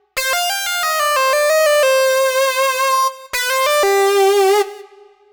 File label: Lead Synth Lick; Riffs & Solos; Dr. Fink's Funk Factory